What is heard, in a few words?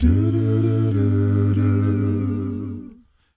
ACCAPELLA, DOO WOPPER, Blues & Soul